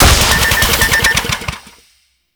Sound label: Veilbreaker Jetpack Out Of Fuel; Warframe; Vehicles